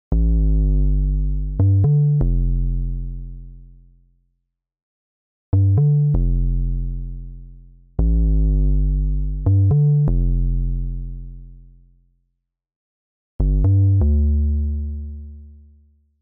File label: Metronome Bass